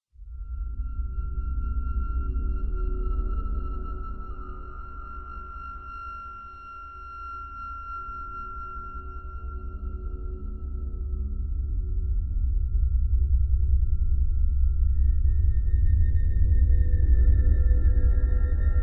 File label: scp ambience